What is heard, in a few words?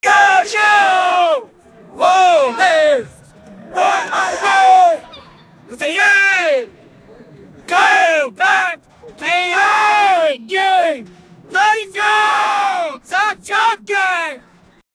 a group of young people, conflict